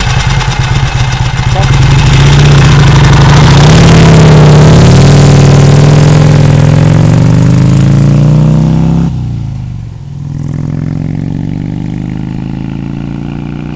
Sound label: Vincent Rapide Startup; 1950 Vincent Rapide Pulling Away